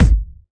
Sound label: rich mid range kick sound g sharp key